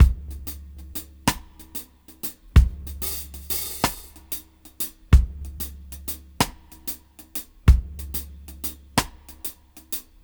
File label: Half Time Slow 94 BPM